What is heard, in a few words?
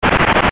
battle; maoudamashii